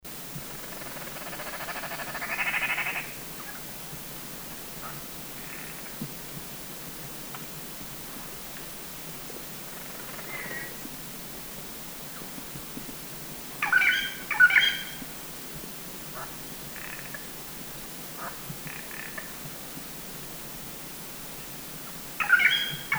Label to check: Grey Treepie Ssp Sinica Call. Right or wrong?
Right